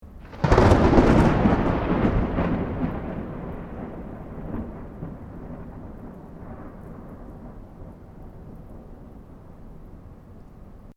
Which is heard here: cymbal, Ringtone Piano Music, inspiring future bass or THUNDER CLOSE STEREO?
THUNDER CLOSE STEREO